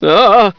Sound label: awww, hostage reactions; czero opforce